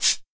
CABASA, HR-16 Drum Mashines; EdgeSounds

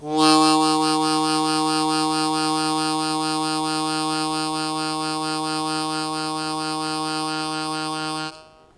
L Sustain Fast Wah Wah Eb0, Harmonica; Zero G - Harmonica

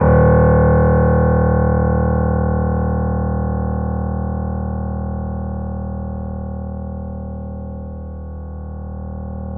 The Piano, D#

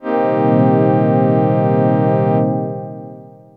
syn orch, dx7

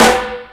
All Snares, E-MU Pro-Cussion

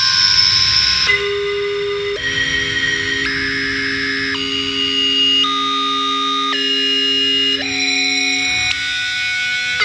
DEEP SPACE, Funk Guitar; 90 s Sample CDs; Zero G

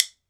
WOOD BONE, Celtic Percussion; Drums&Percussion; E-MU Producer Series Vol. 7 – Old World Instruments

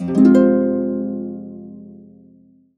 Harps; USB Soundscan vol.62; Complete Harps; AKAI

